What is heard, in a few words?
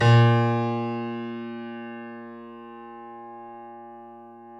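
KEY B flat 1, YC7 Piano mf; Roland L-CD701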